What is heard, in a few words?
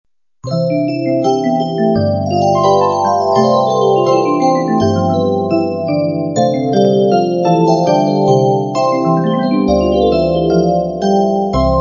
Jingle